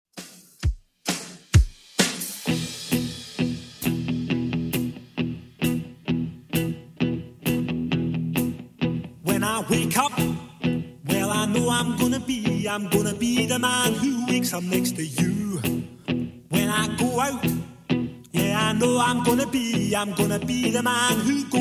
80s Redrum 132bpm

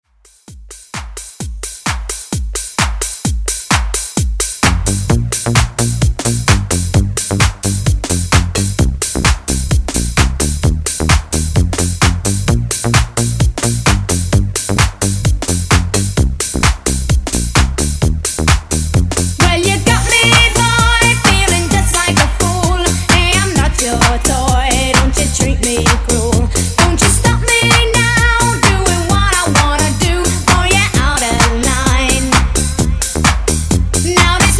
90s Dance Redrum 130Bpm

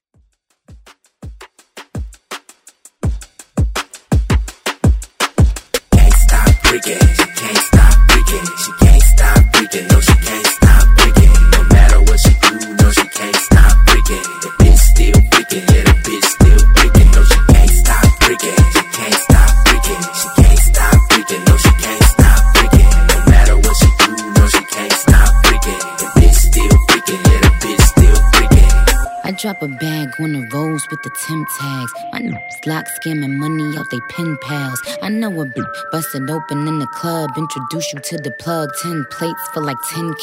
Hype

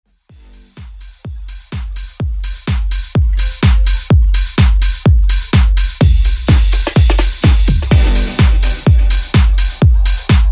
Top40 Bass House

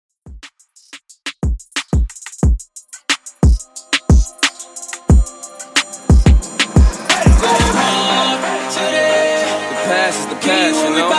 Old School Redrum 90 Bpm Clean

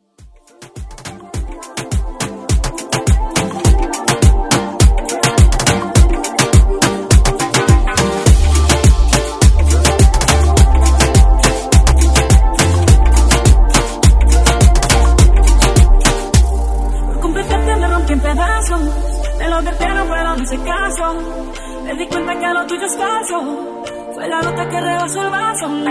Moombah Redrum

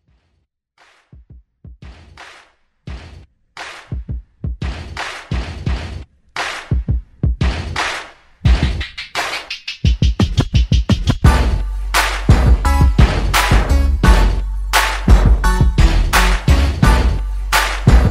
Throwback; Redrum

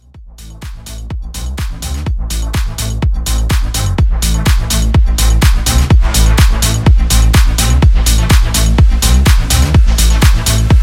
Kuduro Latin Hybrid Mashup

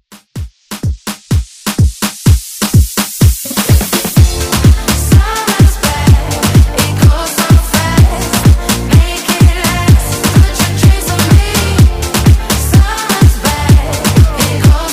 Top40 Redrum

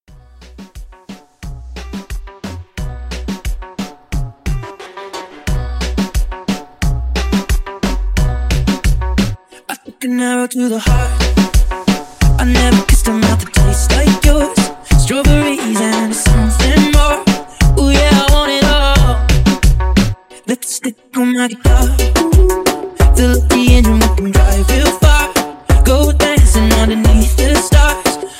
Reggaeton Mashup 89 Clean

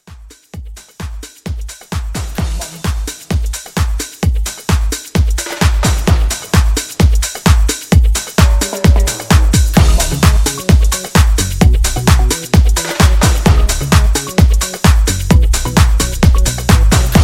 90s Pop Re Drum

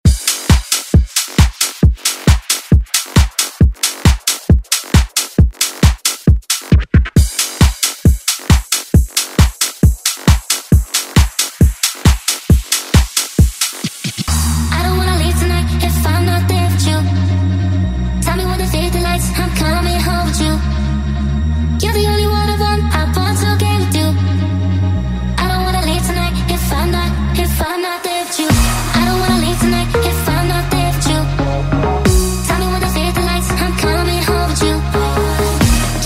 Dance Redrum

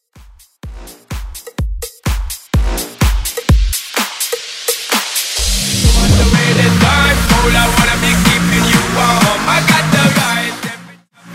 Bootleg Remix CLEAN 126BPM SNIPPET

